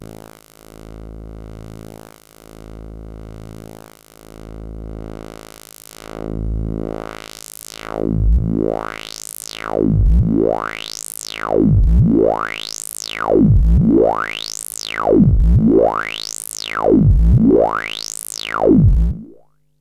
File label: CS BPF; Eurorack